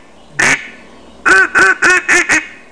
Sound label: duck